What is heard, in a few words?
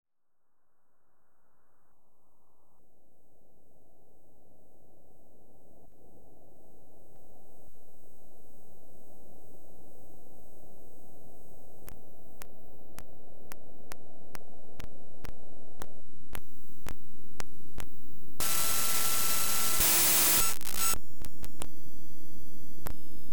street microwave radio noise